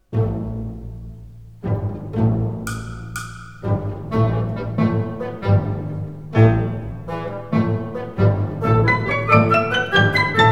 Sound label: Danzon